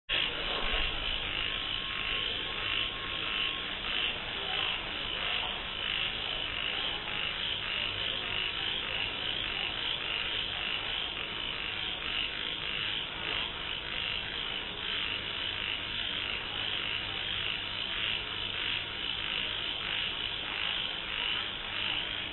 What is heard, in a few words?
Naithon buri Night; Mergui